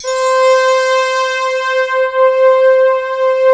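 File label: synthesizer M1, digital; Sound & Vision - Gigapack I CD 2 (Roland)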